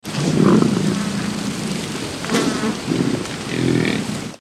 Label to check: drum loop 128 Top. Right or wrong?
Wrong